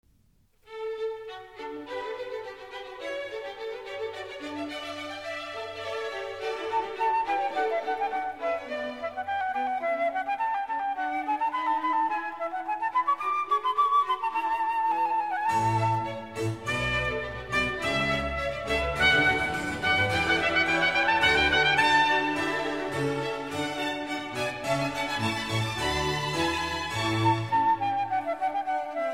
Sinfonia, Trumpet; Alessandro Scarlatti; Sinfonia n.2

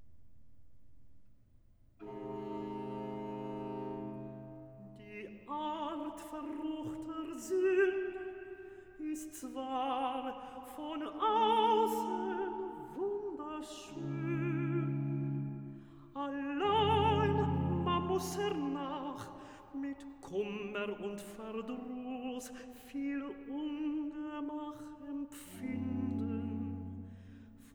Cantata, Rezitativ; Bach; Cantata 'Widerstehe doch der S걆de'; Die Art verruchter S걆de; Baroque Arias

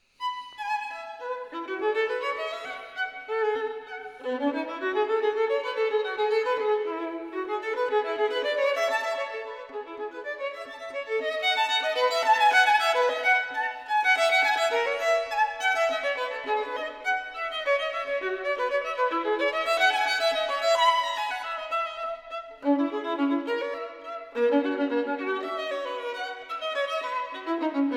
Partita In E Major, Gigue; Partita No3; Bwv 1006

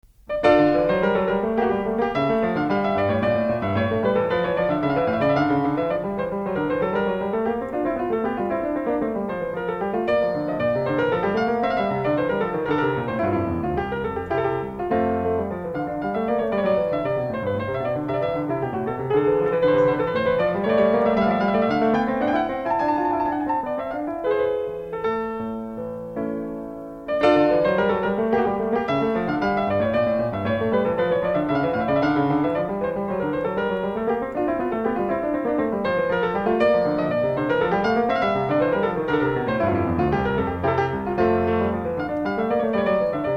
Courante; Bach; English Suite n.6 in d

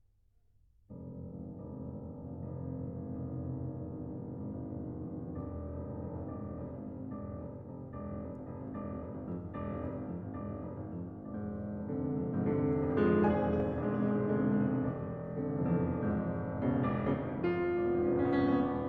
La Valse Pour Piano; La Valse - Poeme Choregraphique Pour Orchestre (Transcription Par L'Auteur Pour Piano)

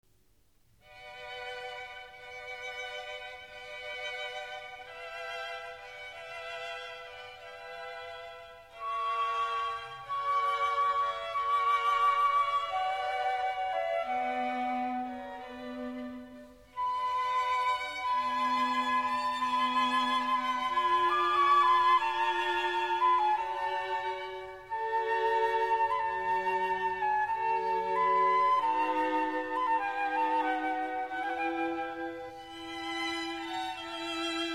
Trumpet, Sinfonia; Alessandro Scarlatti; Sinfonia n.2

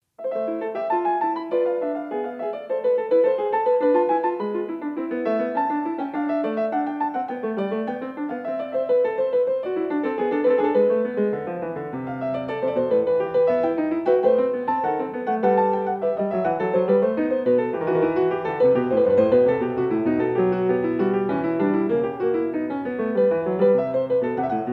English Suite in A minor, Allemande; English Suite No 2; BWV 807; Bach

